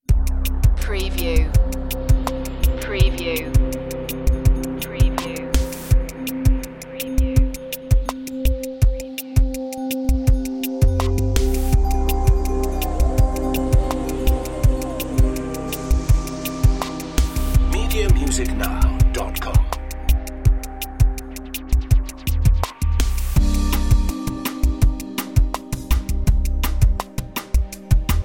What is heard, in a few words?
spectral waves underscore no glitch fx